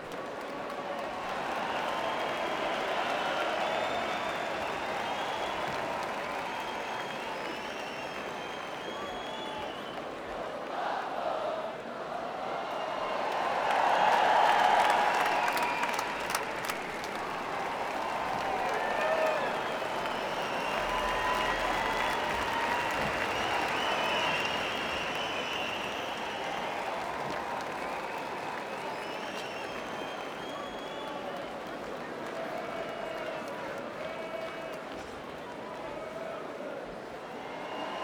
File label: stadium; football